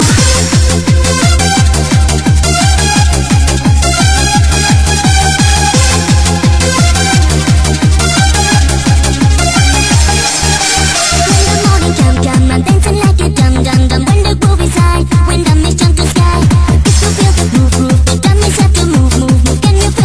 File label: Trance